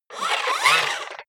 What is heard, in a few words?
robot movement fast